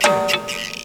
Ragga Lick; Zero-G Jungle Warfare 1, 2 & 3; 90 s Sample CDs

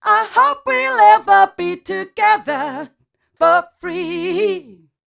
f mel d flat, fem Vocals

